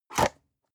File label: storage lid slide close hard, box; objekte